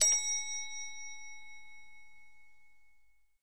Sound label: bell; doctorwho1200